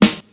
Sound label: sizzle acoustic snare G sharp key, snare drum sound tuned to the G# note; snare drum samples